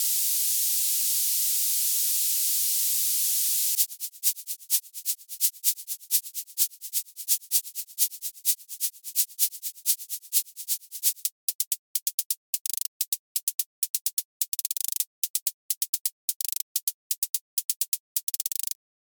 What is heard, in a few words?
White Noise; Inertia